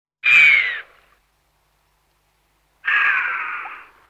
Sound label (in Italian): Camoscio Rupicapra rupicapra; Versi Animali; Il Forum della Fotografia Naturalistica